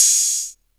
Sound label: damped open hat g sharp key; drum single hits one shots; Open hi-hat one shot samples